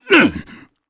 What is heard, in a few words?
Damage, jp; Baudouin; kingsraid